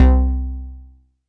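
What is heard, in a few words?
mbrute synth, Arturia MiniBrute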